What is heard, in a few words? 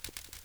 flapping